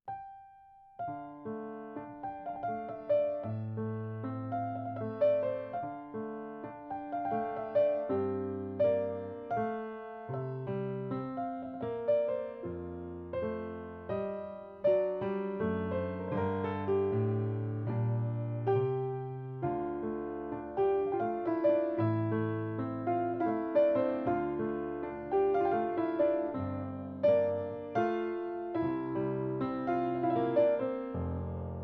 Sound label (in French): Valse; Mel Bonis